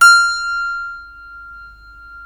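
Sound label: F5 clavinet right, Keyboards Acoustic; USB Soundscan vol.17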